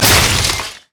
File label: ice shard; LoL; lissandra